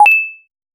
short sci-fi coin pickup; activation

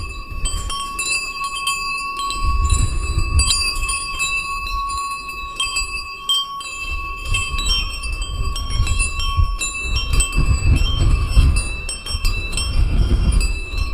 a seaside scene with many, festival

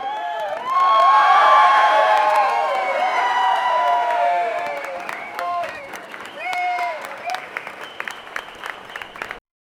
crowd cheering distant fe, festival

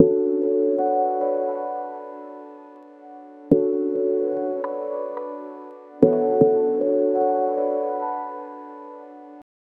soft ambient pads mellow, 78 bpm

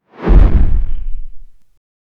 replicate the sound of a, train

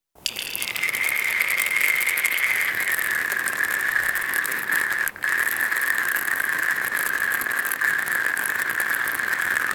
frothy whisking swirl of, beverage

